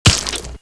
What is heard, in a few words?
blood splash; smurfcat